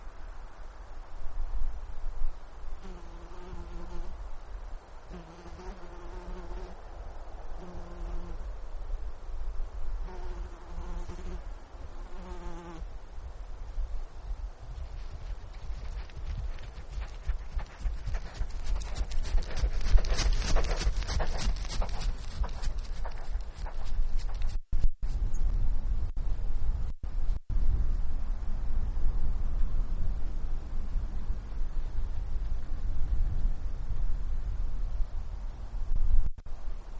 Insect Wingbeats and Running Animal; alaska acoustic highlights

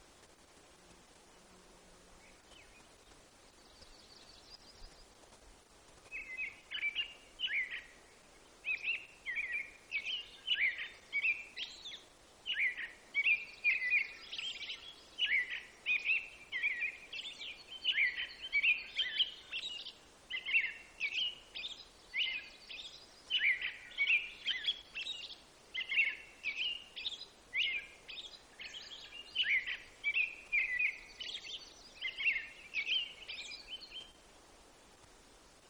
American Robin Song; alaska acoustic highlights; BIRDS

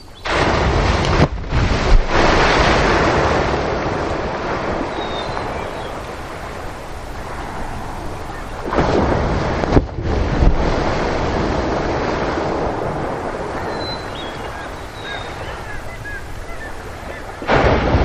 Humpback Whale Tail Slaps; alaska acoustic highlights; MAMMALS

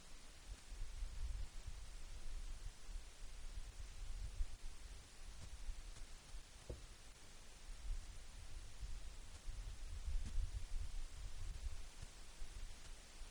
Rock Slide; alaska acoustic highlights; GEOPHONY

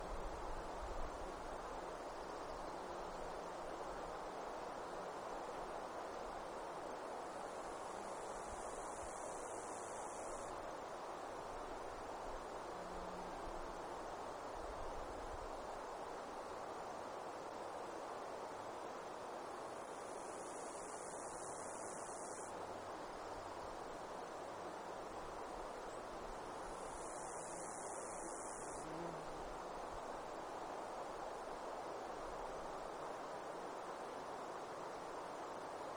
Unknown Grasshopper or Cricket; alaska acoustic highlights; INSECTS

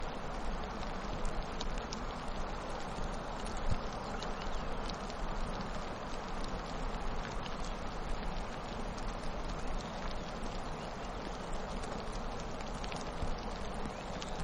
Avalanche With Bird Song; alaska acoustic highlights; GEOPHONY

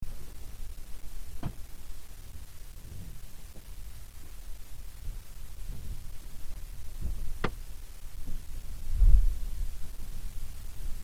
Muldrow River Surge; alaska acoustic highlights; GEOPHONY

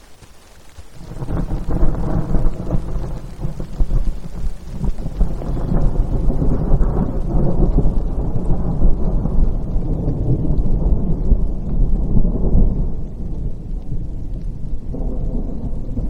Distant Thunder; alaska acoustic highlights; GEOPHONY

